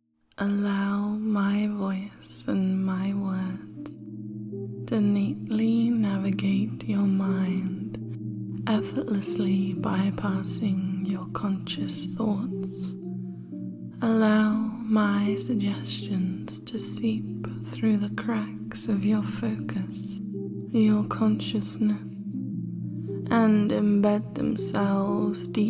Passive Loop for Better Trance and Submission- SFX & Music